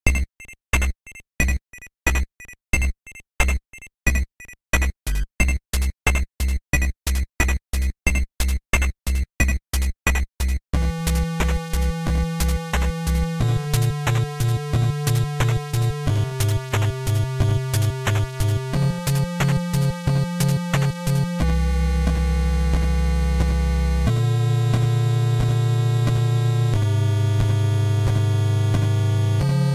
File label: bgm frigid halls